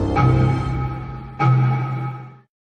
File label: bmw logo old